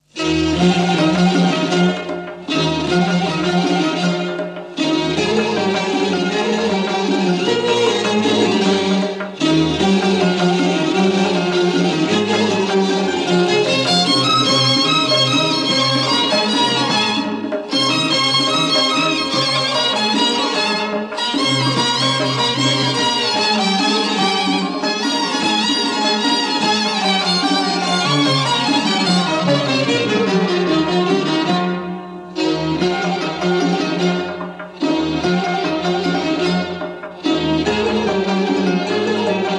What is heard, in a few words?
instrumental; persian